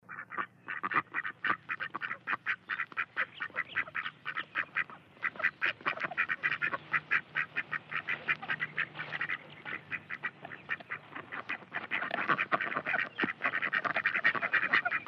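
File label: Group Ducks Chattering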